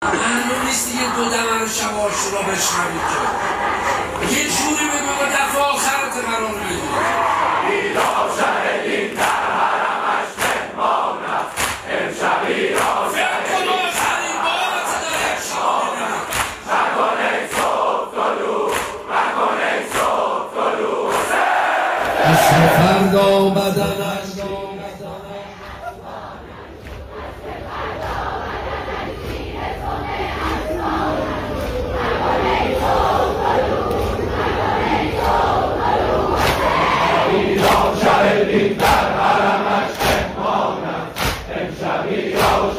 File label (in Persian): مداحی